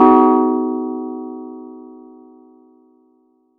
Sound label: Chord; ModelCycles